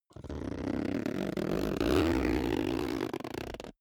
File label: zipper backpack close slow